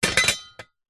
impact metal object bracket plate set down hard surface